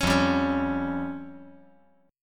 F Suspended 2nd Sharp 5th

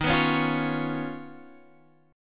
Eb 7th Suspended 2nd Sharp 5th, Guitar Standard; strummed; chord

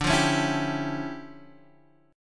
D-Minor Major 11th, D, Guitar, Standard; DmM11 strummed; 10,8,11,9,8,x

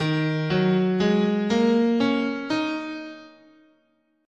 D sharp Minor Pentatonic, guitar, scale

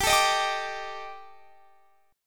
D sharp Major 7th Flat 5th G

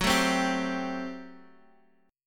G Major 7th Suspended 2nd Suspended 4th Gb

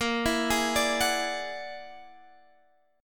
E 9th Flat 5th A sharp, guitar

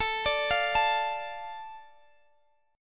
A Suspended 4th Sharp 5th, Guitar Open G

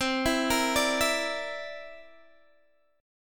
C 9th C down Guitar DADGAD

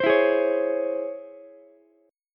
Db Minor Major 7th Ukulele, DbmM7 chord; DbmM7 strummed; JGuitar

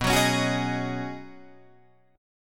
B 6th Add 9th B down Guitar Drop D

